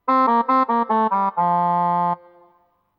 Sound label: horn short